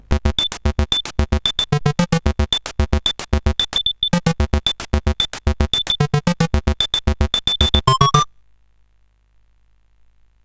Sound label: sid, CSG8580R5; Coco-Notes